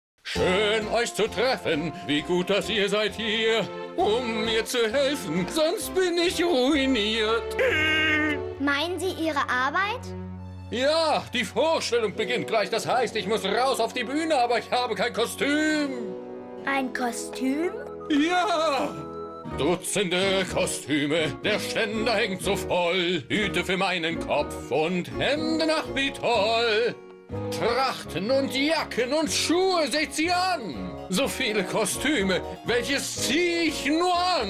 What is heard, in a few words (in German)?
Cartoon Esel Lied